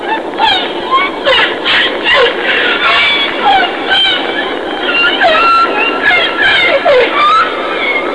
Whale - Party; Olduvai Sounds

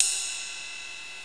RIDE

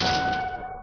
DOOR; Hidden & Dangerous; h&d demo

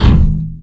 hit wall; wipeout

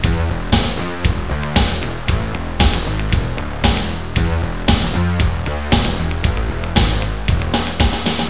BARMUSIC; DUKE3D

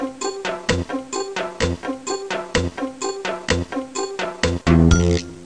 wait soft; Let's Discover Michigan!

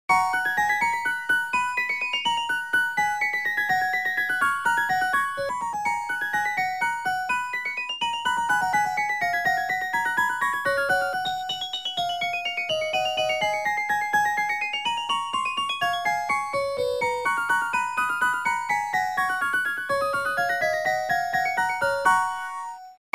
echo; klassik

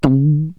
bow thwng, boings; Kaboom! Junior; beep

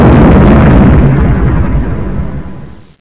Minexplosion

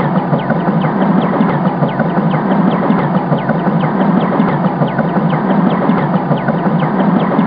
Blades; Apple DTM; MacWorld '89

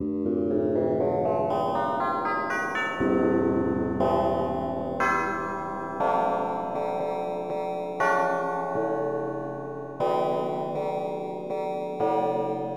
Tubular Bell; FF7

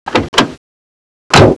CAR DOOR; The Mustang Anthology

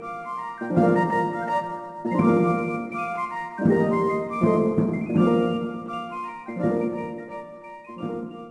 city jig; DAoC

